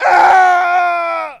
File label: Male Die; Mars Sortie (Arcade) (gamerip) (2018)